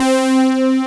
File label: Melody Syn; Best Service Gigapack 1